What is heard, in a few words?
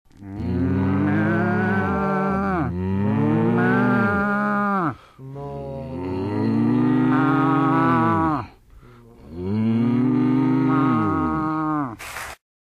cows mooing